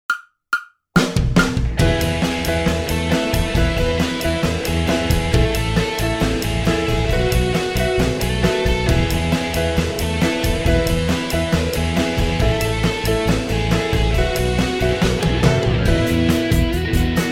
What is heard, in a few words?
139 BPM 16 bar Positive Rock